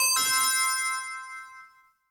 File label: PINPON